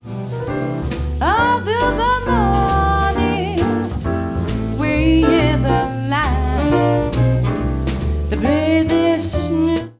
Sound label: Jazz